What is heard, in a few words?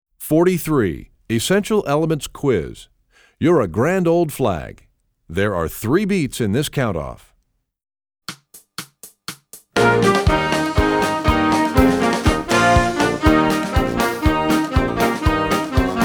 trombone; essential elements